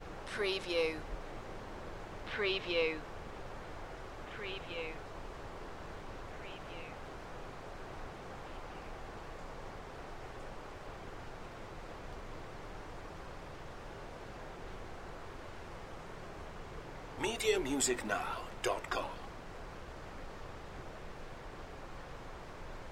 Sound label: Forest Air Ambience